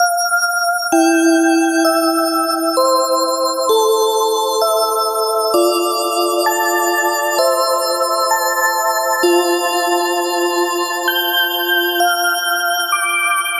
Intro Bells

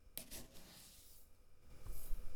Scooping up sugar and throughing back or into

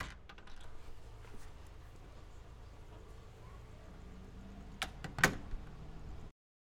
Door Opening And Closing

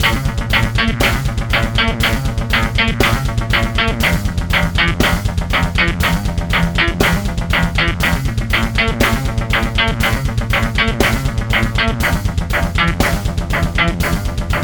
Hard Grunge Loop

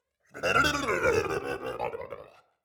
Scream alien

